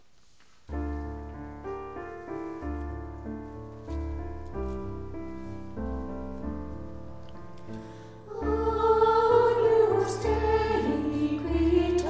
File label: agnus dei choir and piano; Heavenfield mass